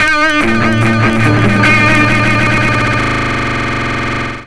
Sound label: FX GTR, GUITARS KIT